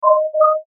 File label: Mouseover Multiple Tone Ascend; Multimedia; Blastwave